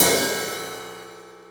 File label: crash